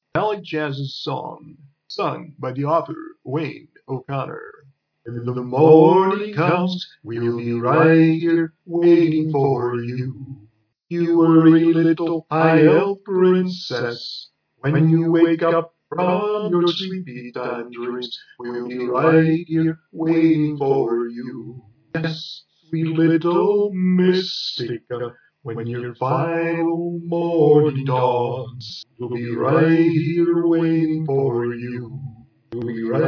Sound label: JAZZ SONG; Carnival World Book One